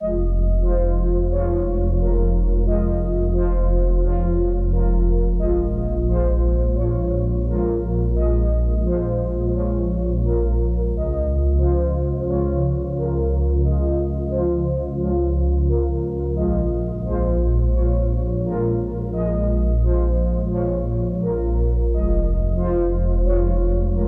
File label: Synth Loop Fm 175; Replicant